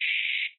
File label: OPENHAT